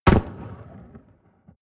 shotgun distant shot

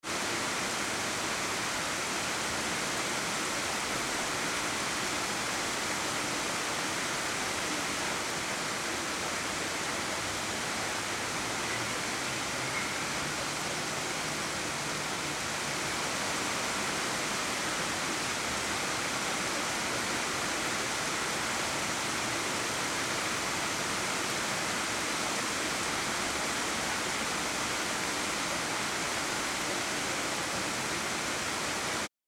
rain ambience

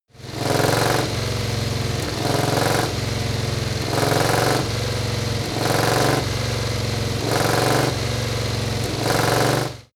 motorcycle harley engine rev roar sequences hard

